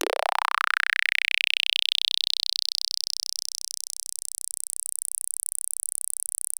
GUIRO; Music Store Sound Service; Einstein

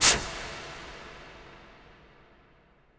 IMP WIPE, Cinematic Impact

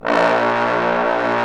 BRS BSTBN, Bs.Bone Sect

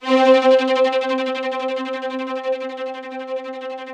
ROUGH STRNGS, Yamaha VP-1; Music Store

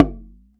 TURK HARD; AKAI S-Series CD-ROM Sound Library VOL-2

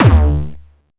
Jump kick, Jump hardstyle kick

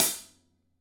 Closed Hat; Digital Sound Factory

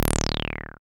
SYN 303, Roland TB-303; Sound & Vision - Gigapack I CD 2

